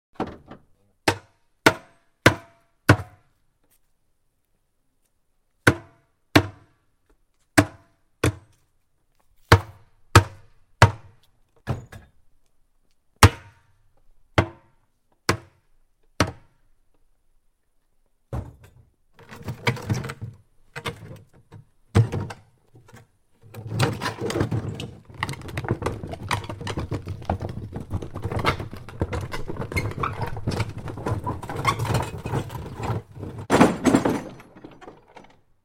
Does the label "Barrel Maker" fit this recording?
Yes